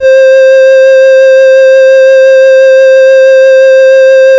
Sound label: Casio VL1 Flute; Solo-Synths; Giga Samples Collection